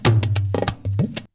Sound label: devastator